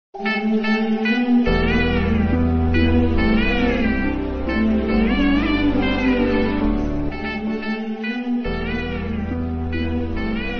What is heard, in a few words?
Instagram Reels Music Ringtone